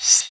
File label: Open Hihat; Vengeance Samples; VEE2 Cymbals